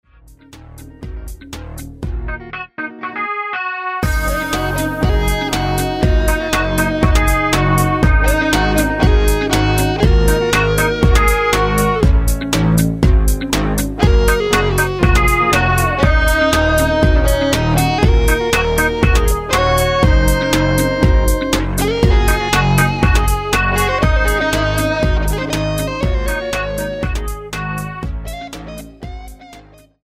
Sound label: mbira